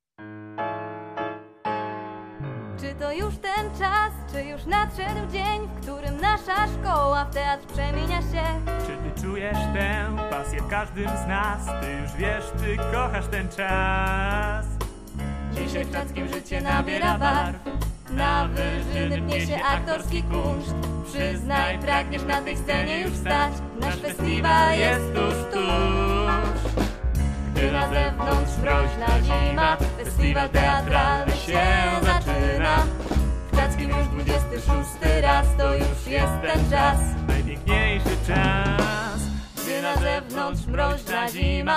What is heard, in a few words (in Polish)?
jingiel